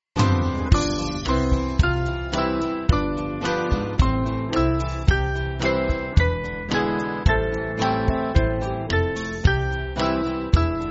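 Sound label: Band, Music Backing; Descend O Holy Spirit; Ewing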